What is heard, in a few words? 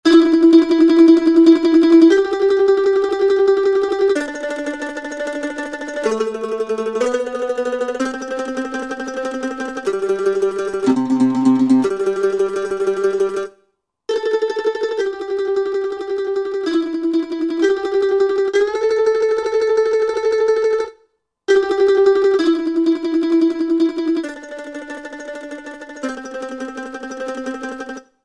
MandolnTrm, Country; Roland SR-JV80-17 Country Collection